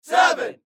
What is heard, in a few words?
Crowd